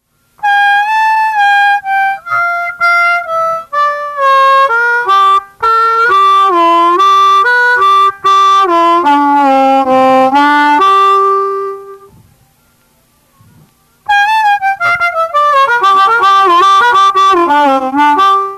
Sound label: lick